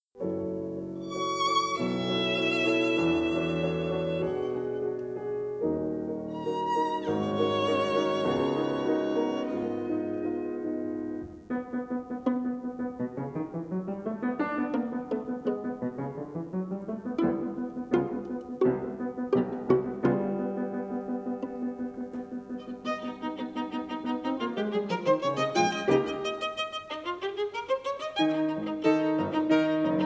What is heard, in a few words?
Debussy Sonata Violin Piano G Minor